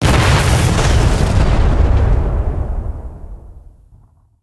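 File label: bomb explosion; War Thunder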